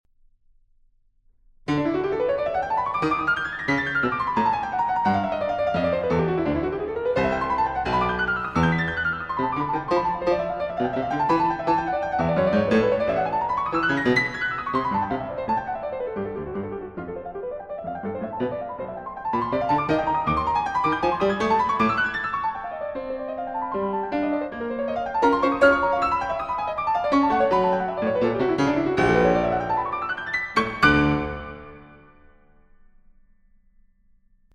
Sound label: Preludes Allegro vivace D Major